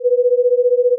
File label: 500 Hz plus 485 Hz, 15 beats